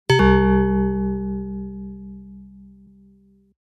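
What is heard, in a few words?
moonless sms, sonnerie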